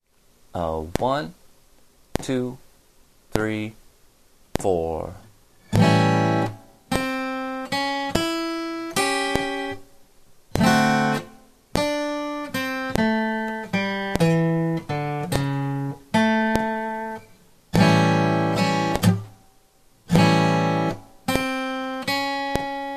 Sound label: blues play along